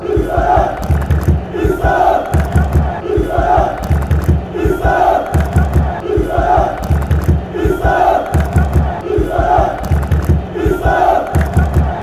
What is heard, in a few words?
turkish chant